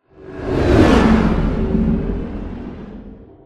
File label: Prop Warehouse Prop Rack Pass By; Titanfall 2; Boomtown